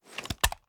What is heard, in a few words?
sm uzulu reload empty xxmag end; reloads; iw8; gmod